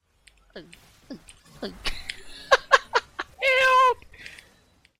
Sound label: mosquito bites